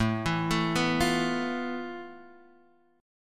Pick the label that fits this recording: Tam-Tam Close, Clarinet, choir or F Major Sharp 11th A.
F Major Sharp 11th A